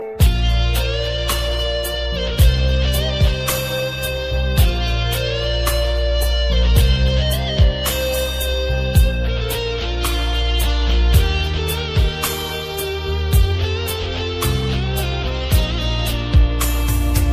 sax melody

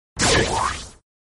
teleportation sound; jiren

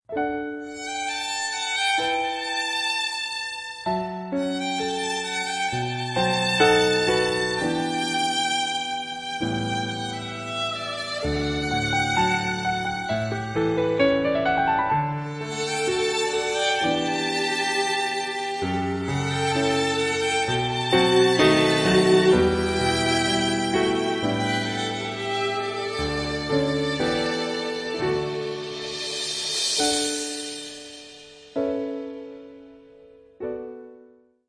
romantic

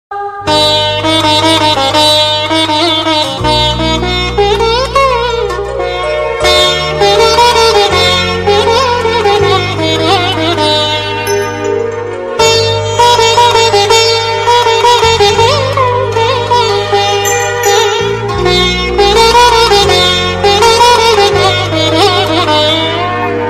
guitar

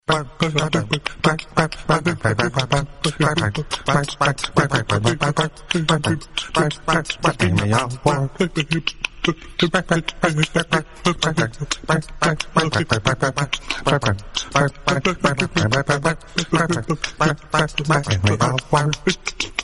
ring

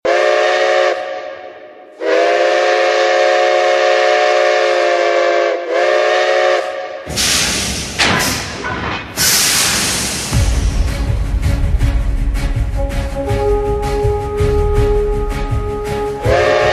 steam train

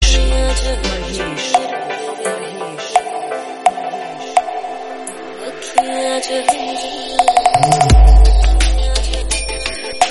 trance

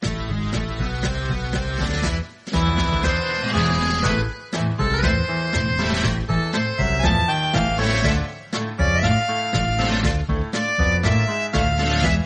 romantic

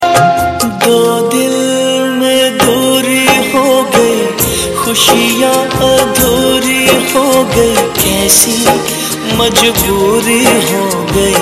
sad hit

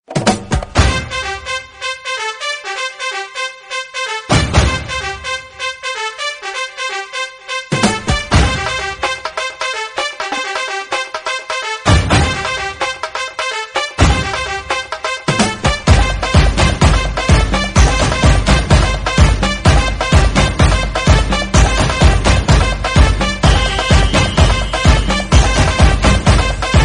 version samba

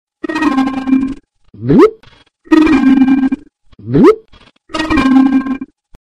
beep blop

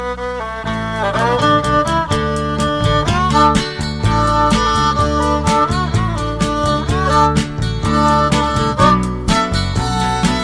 theme song; deadwood

